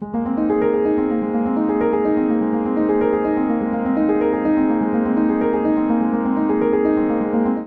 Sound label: Ostinato Ab Major 125 bpm, Upright Piano; Whirling; Upright Piano by Spitfire Audio; Ableton; Factory Packs